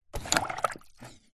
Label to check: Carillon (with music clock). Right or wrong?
Wrong